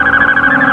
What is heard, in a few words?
drone idle; gearbox